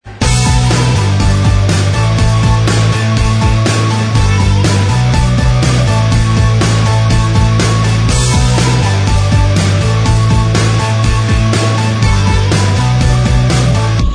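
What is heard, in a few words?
instrumental, backing tracks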